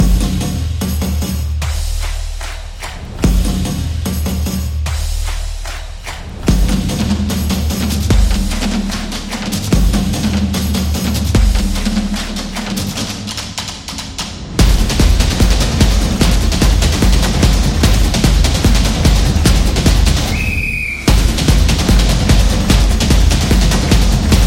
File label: The Football Stadium Samba Drums